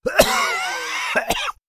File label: Male Cough; beanstalk audio